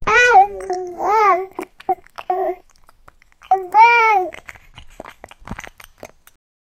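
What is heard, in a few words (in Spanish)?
dos bebés bebiendo de bibe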